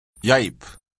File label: yipe